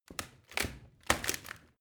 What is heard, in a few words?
rotten wood break